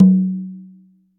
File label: Tumba OP, Drum Machines